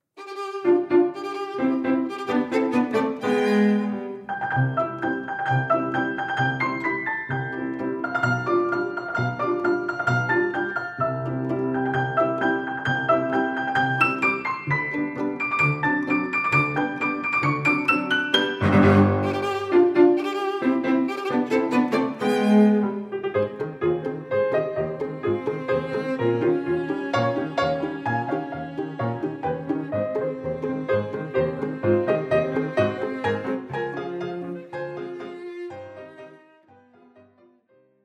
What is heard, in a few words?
Polka, PIANO TRIO JAZZ SUITE; SHOSTAKOVICH